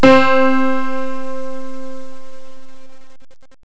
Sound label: fx piano